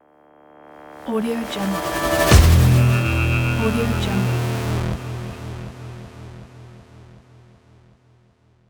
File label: strike hit